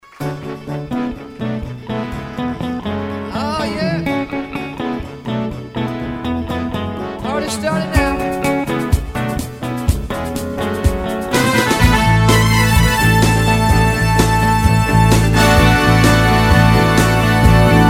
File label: groove trio